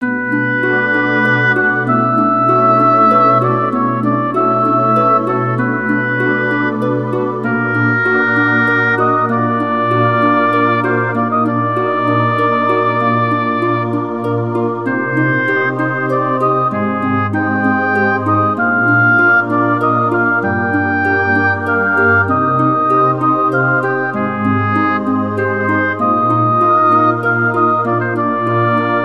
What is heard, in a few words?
blue crave